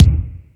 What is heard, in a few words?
Lotsa Kicks, Drum Machines